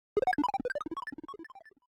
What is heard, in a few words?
Boss BigPunch Stinger; Avie